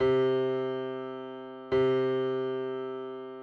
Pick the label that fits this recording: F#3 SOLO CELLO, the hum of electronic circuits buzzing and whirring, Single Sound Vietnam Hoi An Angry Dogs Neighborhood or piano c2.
piano c2